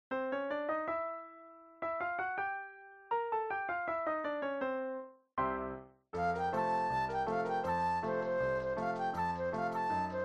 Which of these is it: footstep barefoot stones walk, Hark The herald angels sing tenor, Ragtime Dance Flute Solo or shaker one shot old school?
Ragtime Dance Flute Solo